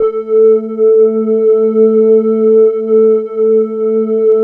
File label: SYN, Analog; Sound & Vision; Gigapack I CD 2